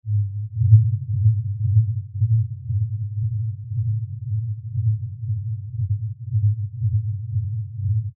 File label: 105hz isol